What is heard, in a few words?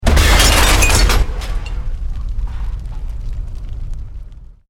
EXPLOSION MEDIUM METAL GLASS